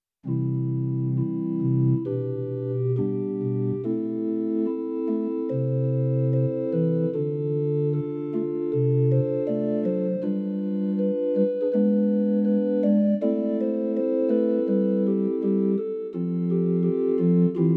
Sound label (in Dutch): Kerkorgel, Roland FR-8X V-Accordion; church organs